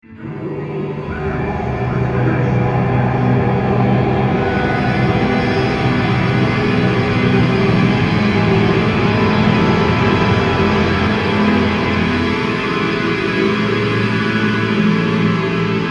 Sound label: Dark classical sample